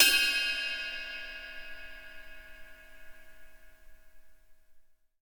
roomy ride single hit d sharp key

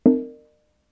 conga studio hit; percussion; single hits one shots

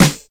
focused snare one shot d sharp key

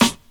bright rap acoustic snare sound a sharp key

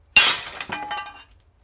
small glass broken with a hammer - garage

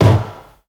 disco kickdrum sample a key; kick drum samples

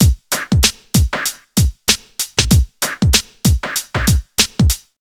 96 bpm drum loop c sharp key

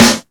prominent snare one shot a sharp key, snare

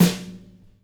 strong bottom end snare f key; snare-drum-samples

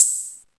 wet open hat one shot c key

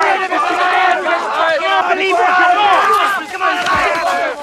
group yelling

